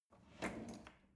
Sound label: drawer cutlery open draw short